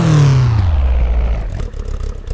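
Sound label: slowdown, vehicles; blista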